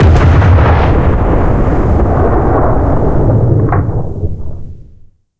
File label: cannon 122 plus tail far; guns; tanks; War Thunder